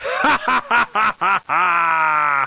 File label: hahaha